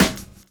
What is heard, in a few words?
DRUM CHOP; AMG Gota Yashiki Groove Activator